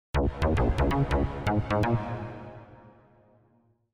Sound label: Wonky Bass Wet